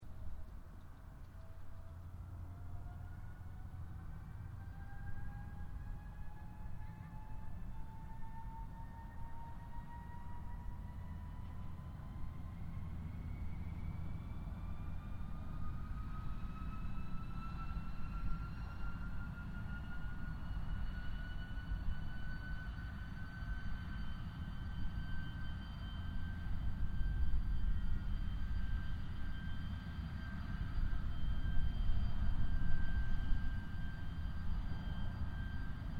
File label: AIRCRAFT GLOSTER METEOR F MK8 STARTUP DISTANT; Gloster Meteor figter jet; Airplanes; Vehicles; Aircraft Collection; Twistedtracks